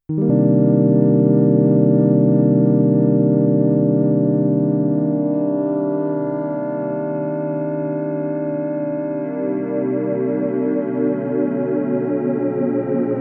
Modal A8 Pad

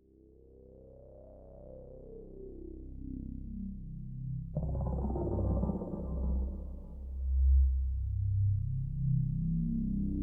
Fizmo Jurassic

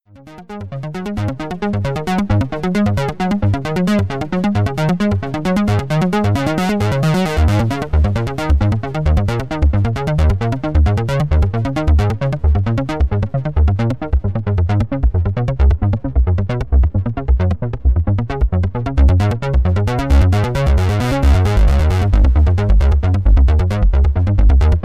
Juno 60 Arpeggio

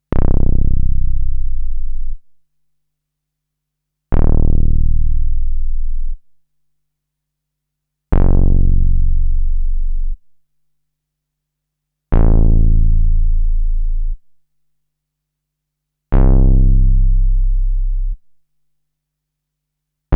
SQ Bass, synthesizer

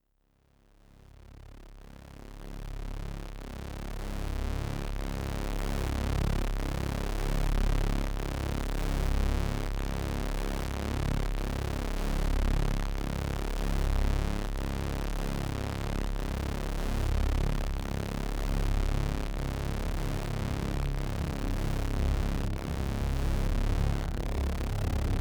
Sequential Prophet T8 Sequence

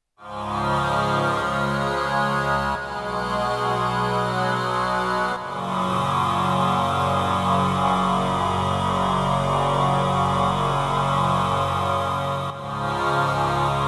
A8 Frontier Pad; Modal

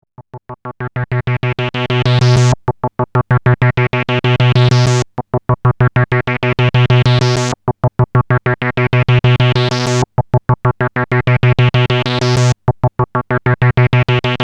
Seq D

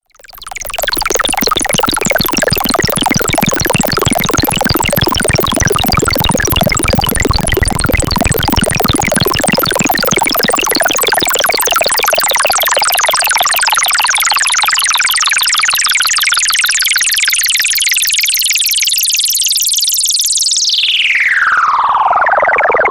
LFO FX

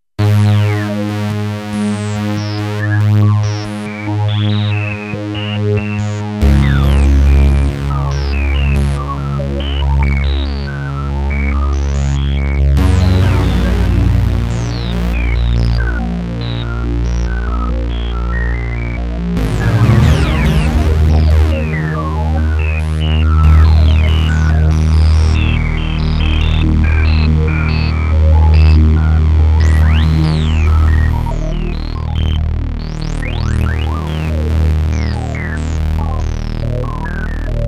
Solaris Sample Hold

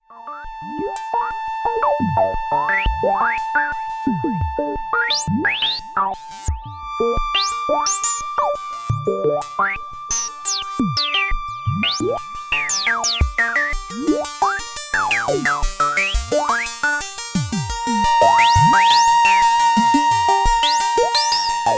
bandpass

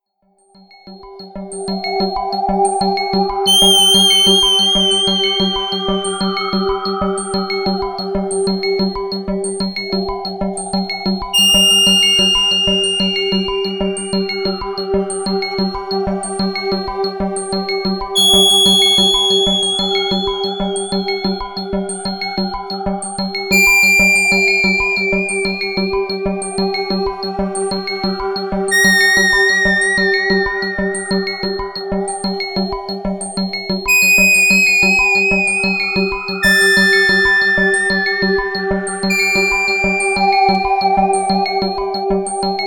Sequential Prophet5 Mix

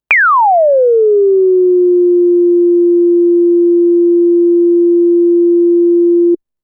Self Osc VCF, synthesizer

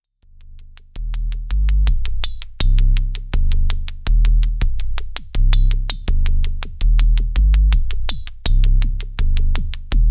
S100F

